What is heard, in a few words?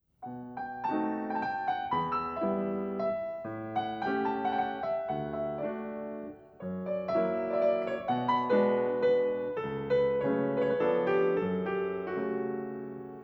Mazurka